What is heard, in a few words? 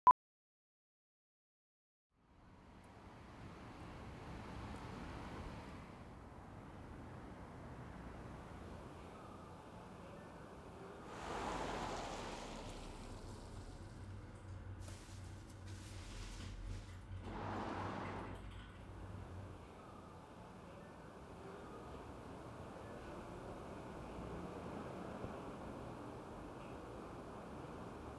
SFX BG; LAVA FIELD Trailers; Hamarinn2